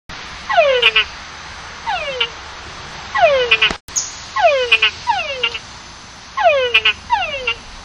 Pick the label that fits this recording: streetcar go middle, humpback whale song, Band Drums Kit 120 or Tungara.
Tungara